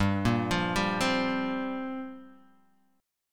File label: F Suspended 2nd Suspended 4th G